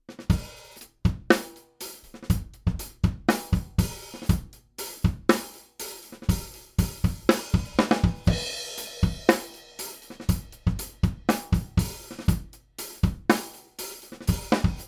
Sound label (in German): AMS Neve 33609N Drum Bus 2 zu 1 Leichte Kompression max. Reduktion 3 dB